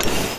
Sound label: door move; Dystopia; doors